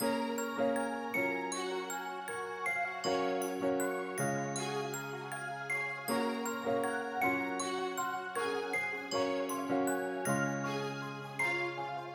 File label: 158 bpm